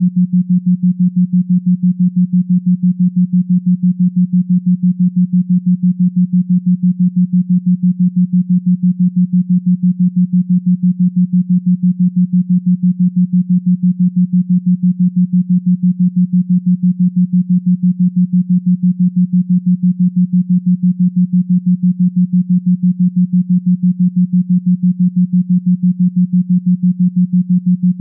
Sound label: theta drift 6hz isochronic; meditations